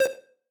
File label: synth